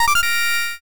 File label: quest clear